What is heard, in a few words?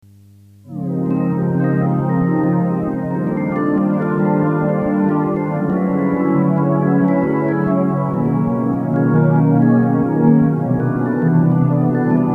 dreamy